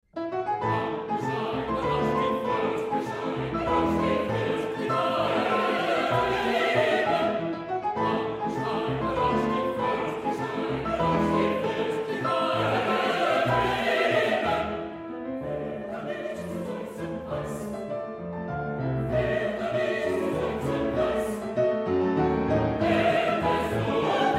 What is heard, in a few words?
Waltz; brahms; liebeslieder